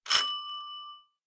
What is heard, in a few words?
Bike, notifications; Coolpad; C558